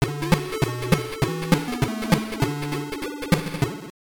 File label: Level Clear; The Jungle Book; Master System